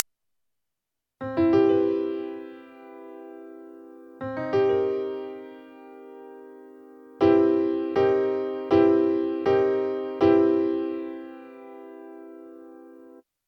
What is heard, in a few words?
maj7 vs m7